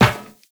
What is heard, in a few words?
Run Metal Perforated, footsteps; Warframe; Tenno